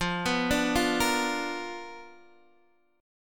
B Minor Major 7th Flat 5th, chord

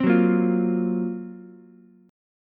F sharp Major 7th Flat 5th, Ukulele; strummed; JGuitar

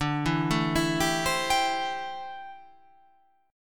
D 7th Suspended 2nd Suspended 4th D

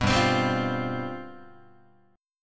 G 11th, Guitar; G11 strummed

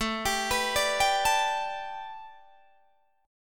A 9th Suspended 4th A, Guitar DADGAD

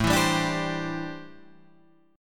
C 6th Add 9th A

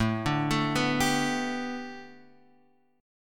A 9th Suspended 4th, Guitar; arpeggiated

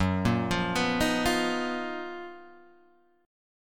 F sharp Major 13th, Guitar, Standard; arpeggiated; JGuitar

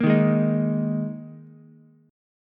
Bb 7th Suspended 4th Ukulele, chord; strummed; JGuitar

